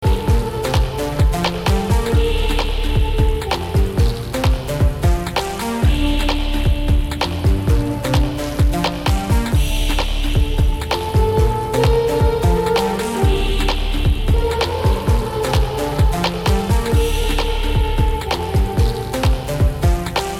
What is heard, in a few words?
riff